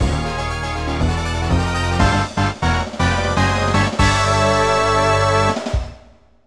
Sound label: Race Start Fanfare; mario kart 64